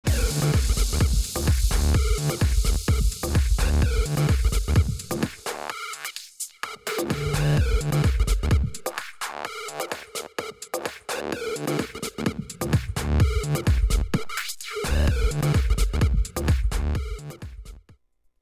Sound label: VCF HP Wild